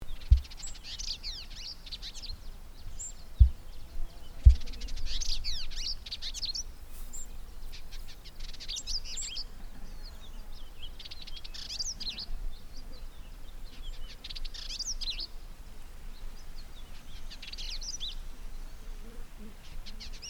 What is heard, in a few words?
Vidua funera; Indigobird Song